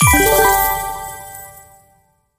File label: chest